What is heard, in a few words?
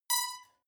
notification beep flutter; kommunikation; signale